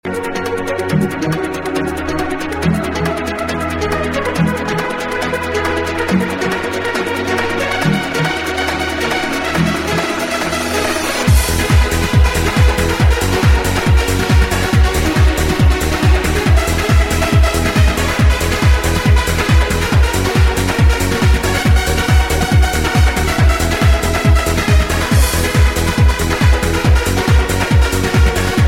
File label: bongo song